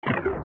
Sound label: Door Membrane Close; zelda; OOT